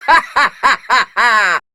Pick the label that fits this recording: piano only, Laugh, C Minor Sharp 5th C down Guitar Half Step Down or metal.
Laugh